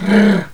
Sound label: NOISE, CLASSIC VOX; Best Service; Hallelujah